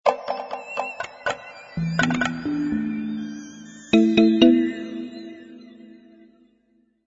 Music Theme

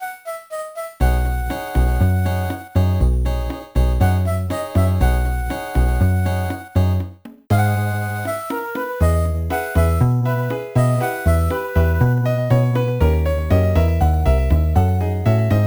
Bossa Nova; Lilycove City

